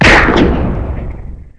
PISTOLA; redneck

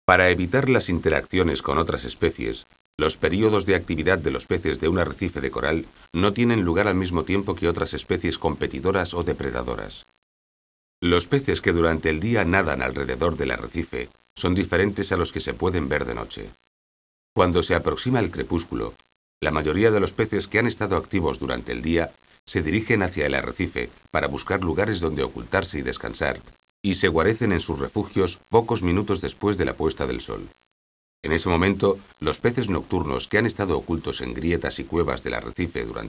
ritmo

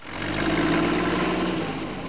camion; Interactive Media Design Review 1999

